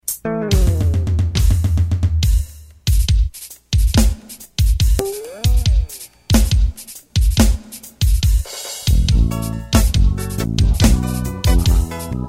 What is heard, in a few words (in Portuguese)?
bass pagode